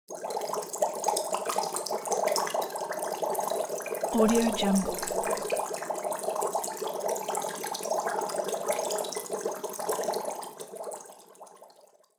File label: bubbles